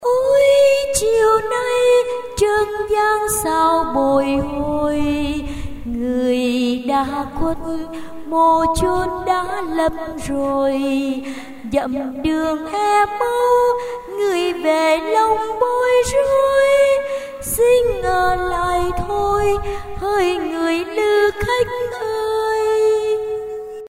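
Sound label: soprano tenor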